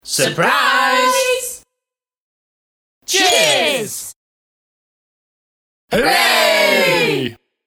Surprise Party Pack, Group voices shouting 'Surprise' 'Cheers' and 'Hooray'; Holidays